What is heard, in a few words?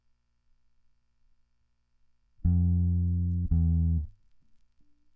bass